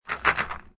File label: Billiard Pool Snooker Rack Up Balls In Frame